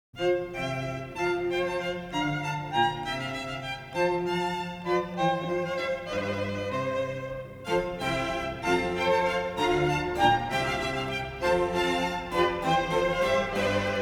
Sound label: Quintette avec clarinette en A Major; Wolfgang Amadeus Mozart; K. 581 Allegro con variazioni